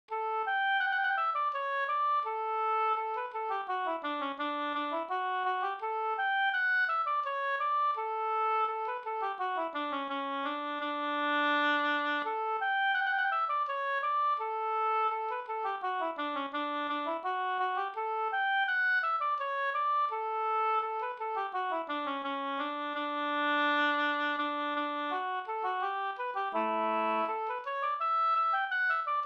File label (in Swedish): ganglat; Slunk Hans ganglat Bingsjo